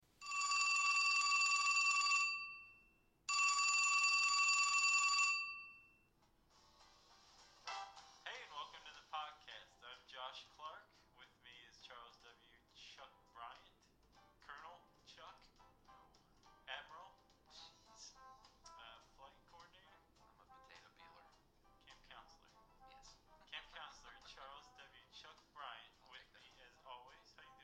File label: galaxy nexus back speaker